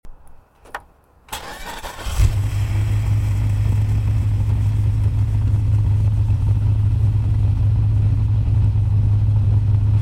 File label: car engine; transformers themed sensory story